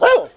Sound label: dog bark; Ringling Bros. and Barnum & Bailey; Wii